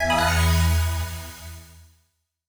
blow; CrossCode

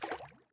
waterblock hit; CrossCode

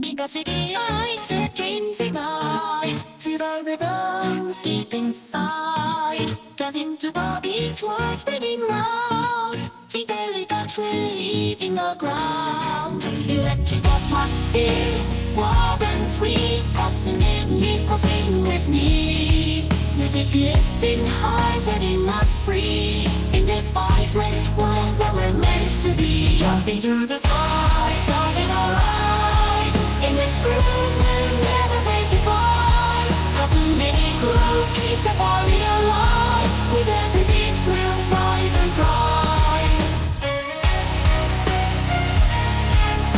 slowed + reverb

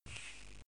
flup

